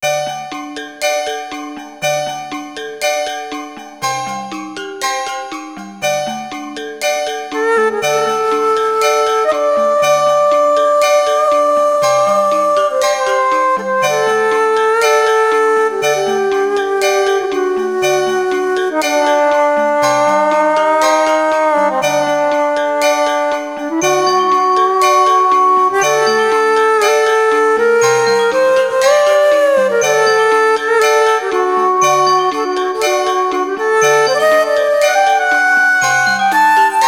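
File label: Slumber Alarm, ringtones; Sony Ericsson; PlusFourSix; Lars Edvall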